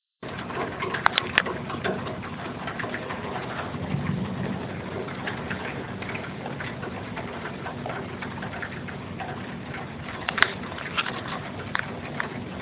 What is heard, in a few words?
thunderstorm